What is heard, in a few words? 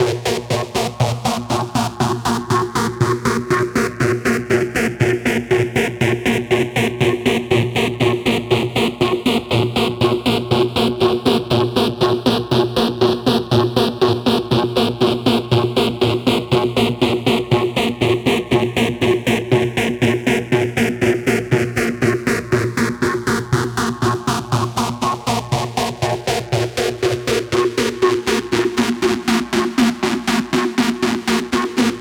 Filtered Sweep, Trance; Sonic Foundry (Sony Creative Software); Trance NRG VOL-2